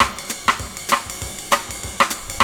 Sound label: BOSSA 100 BPM, loop; 100-114 BPM